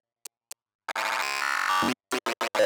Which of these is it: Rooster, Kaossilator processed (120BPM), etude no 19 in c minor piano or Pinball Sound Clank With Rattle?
Kaossilator processed (120BPM)